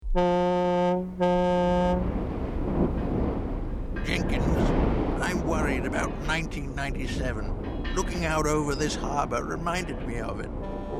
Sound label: Harbour Foghorns